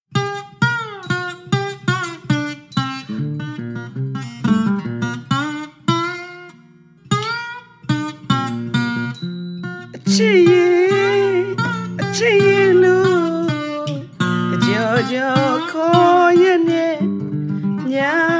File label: music of burma